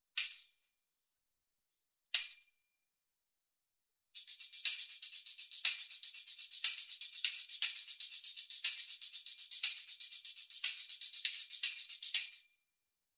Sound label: brass